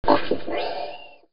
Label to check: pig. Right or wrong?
Right